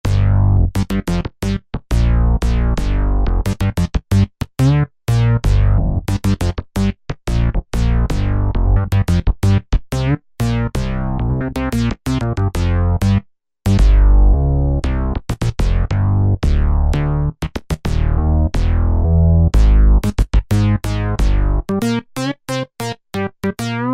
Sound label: Ba-Boogie On A, Yamaha EX5R